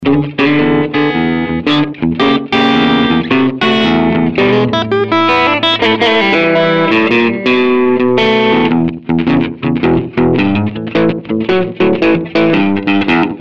THR10C US Blues

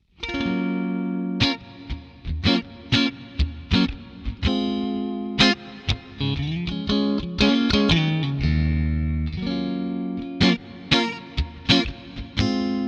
THR10C Deluxe

